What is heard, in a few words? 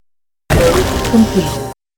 Mode Completed (SFX); Metroid Prime Pinball